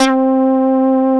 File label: HS BA, synthesizer bass; Synthesizers; Soli-Music; World of Synthesizers VOL-1